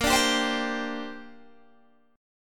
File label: A sharp 6th Add 9th A sharp, Guitar Open G; down